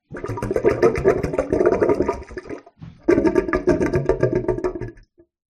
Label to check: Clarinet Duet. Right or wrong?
Wrong